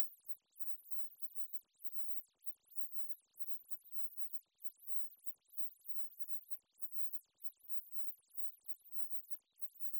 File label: warbles